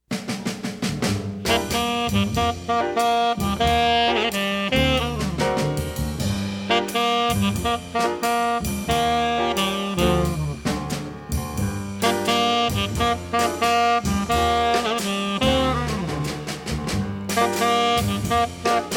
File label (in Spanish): instrumentos jazz